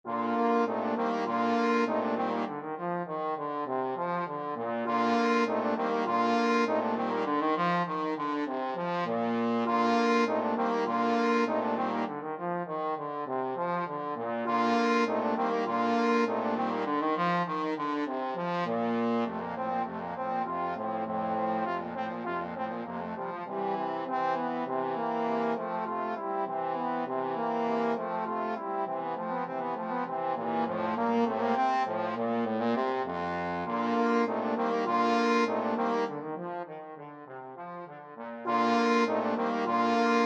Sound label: trombone quartet